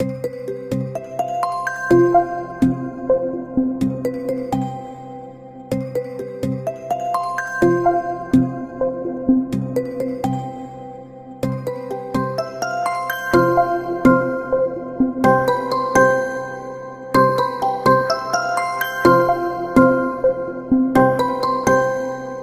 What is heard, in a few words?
Whirl Of Notes; MagicOS 7.1